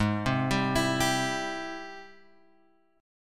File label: G sharp Augmented Major 7th G sharp, guitar; G#+M7 Chord {4 3 5 X 5 3} arpeggiated